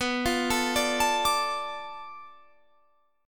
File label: D Minor, B; Dm/B arpeggiated